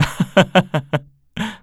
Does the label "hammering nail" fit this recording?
No